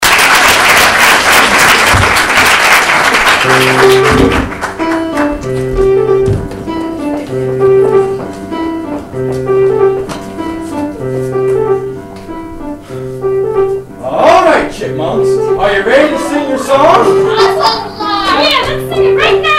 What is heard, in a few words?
chipmunks